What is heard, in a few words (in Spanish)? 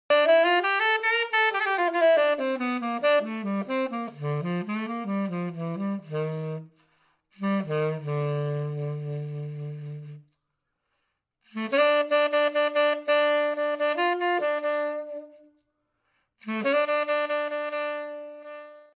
saxofon